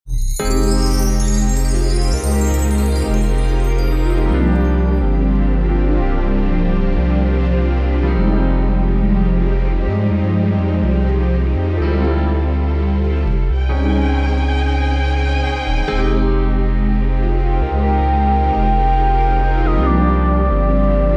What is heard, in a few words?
instrumental